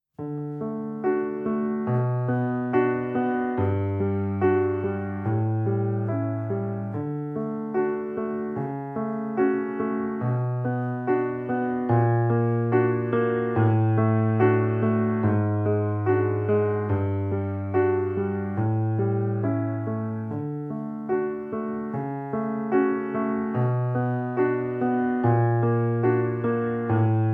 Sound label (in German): kleine improvisation